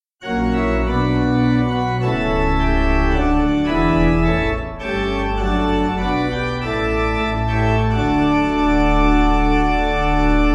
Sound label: Pipe